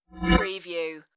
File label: computer interface message